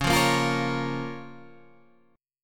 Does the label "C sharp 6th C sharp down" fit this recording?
Yes